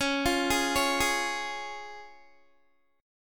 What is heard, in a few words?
C sharp Major, Guitar; arpeggiated; 21,20,18,18,20,x